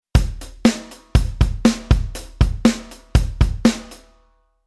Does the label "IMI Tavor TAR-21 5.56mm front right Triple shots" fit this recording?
No